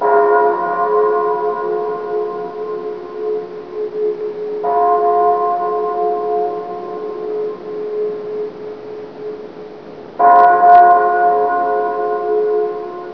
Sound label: cath bells; cstrike; kzsca flyingcarpets